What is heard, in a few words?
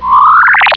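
knight rider sensor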